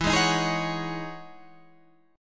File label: E Major 13th chord